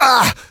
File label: pain